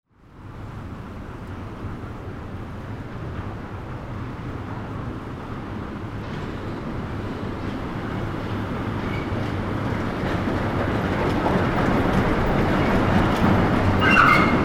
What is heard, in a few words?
cable car passing